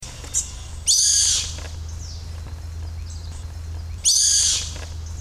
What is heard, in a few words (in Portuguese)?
carrapateiro, aves